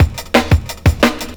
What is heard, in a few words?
Hip Hop 175, Drum&Bass Breaks; EZ Rollers